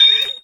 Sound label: SFX HYPER, Processed Kit